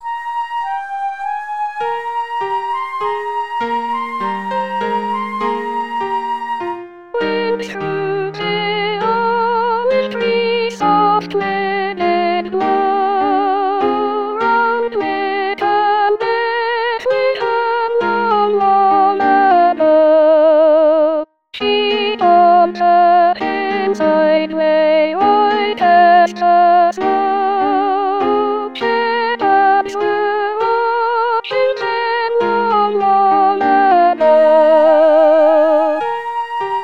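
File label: Soprano, rehearsal tracks christmas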